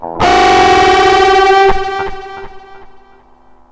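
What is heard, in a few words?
DISTFX, MISC guitar; USB Soundscan vol.31; Miro s Grooves; AKAI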